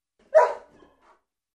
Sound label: Single Dog Bark